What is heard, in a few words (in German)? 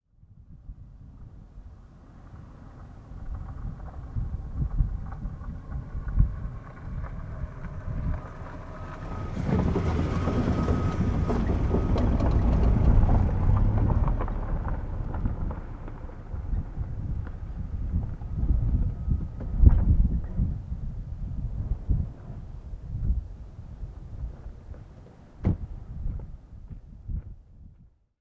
grettislaug nebel; Ristarhlid